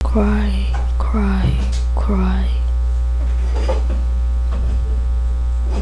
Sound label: cry